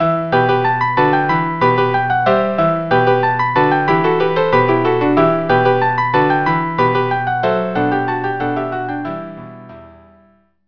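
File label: freylekh string quartet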